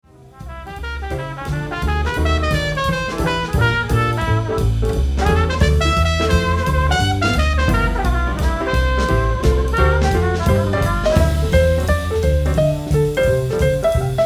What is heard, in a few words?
bell, jazz